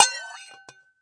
Coffee Pot Scrape; UNUSUAL KITS and FX; ModeAudio

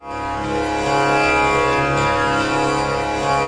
Pilu, Far East Drones and Plucks

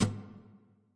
Nylon Hit, guitar body and string hits; UNUSUAL KITS and FX

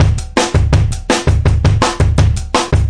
165 live 60s beat, drum loops and breaks; 161 to 180 bpm

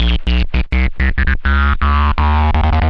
nasty climber, bass loops

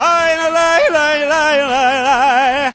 enthusiastic balkan, male singing; VOCALS and SPOKEN WORD

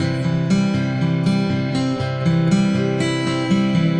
acoustic guitar picking

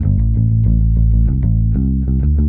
gentle punk bass, synth and electronic loops

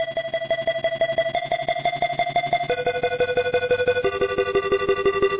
178 synthline; remixable collections

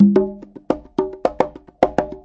090 bongos, 090 bpm; Bazillions of Bongos

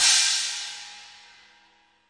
real life CRASH, Real Life Kit; REAL LIVE KITS; Another Real Life Kit

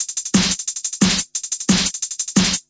178 harsh hats snare, drum loops and breaks; 161 to 180 bpm

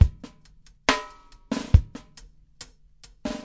70 bpm, super slow ghost, drum loops and breaks

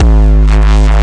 desecrated bass hit, evil bass sounds

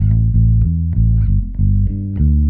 wandering bass back, synth and electronic loops

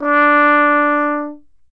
french horn mute d4; Horns and Brass

